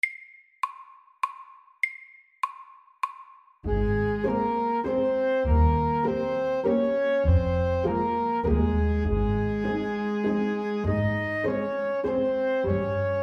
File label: Legato vals MEDIUM 100